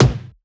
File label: Percussion; FLPRO